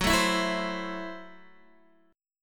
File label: Gb Minor 7th Flat 5th Gb Guitar DADGAD; down; chord shape